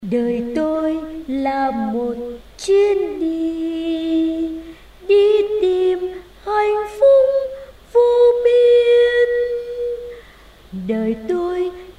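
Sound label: soprano alto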